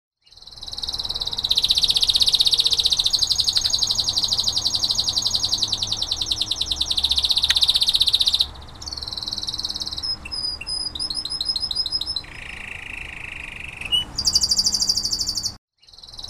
kenari berner

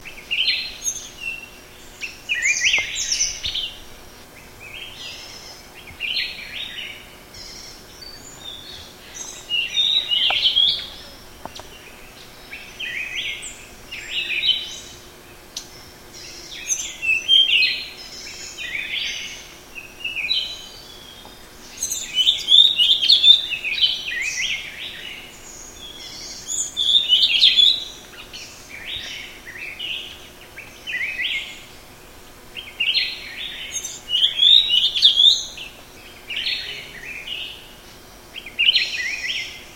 suara kicauan sikatan hainan jantan

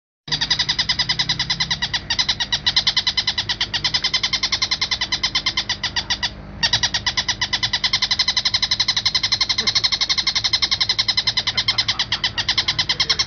burung tengkek

